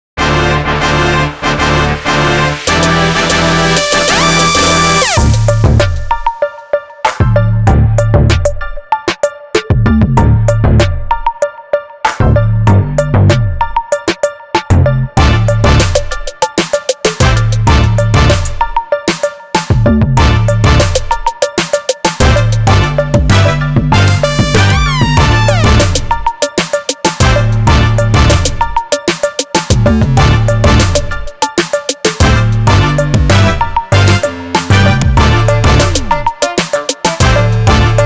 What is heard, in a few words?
HIP-HOP GRAND